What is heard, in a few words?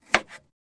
Cutting, Slicing Carrot, Single Slice; utensils